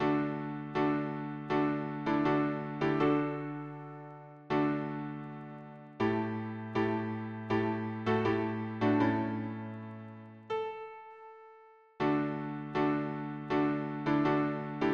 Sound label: harmony piano